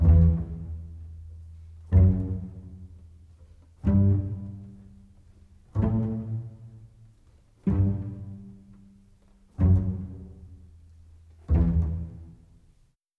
Bass Cello Pizz; Orchestral; Sonic Foundry (Sony Creative Software); Orchestral VOL-2; Modern

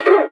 FRET, FINGER MUTE; Xsample VOL-1

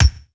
Bd 12 Bit, Kick Drums; Zero-G; Soundclash

